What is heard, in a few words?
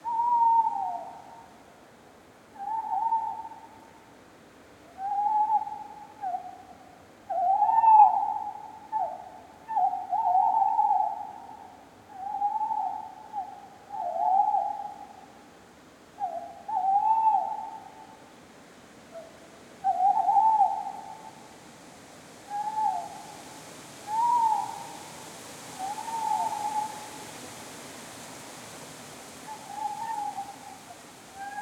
Spring Night Deciduous Forest Many Tawny Owls Wind Leaves Rustling; Forest Ambiences; BIRDPrey; Justsoundeffects